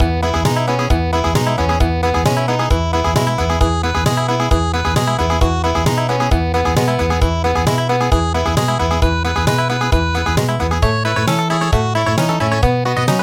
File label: Country, traditional; midi